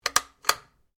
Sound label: Rifle Anschutz 67 MSR 22 Calibre Bolt Action Open; A selection of domesttic firearms; Rifles